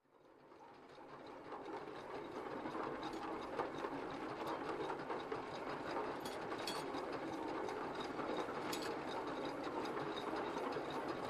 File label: Farming device approaching rolling over field